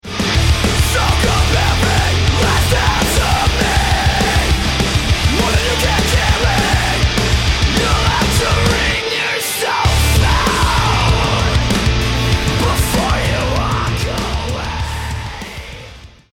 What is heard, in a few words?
start action, music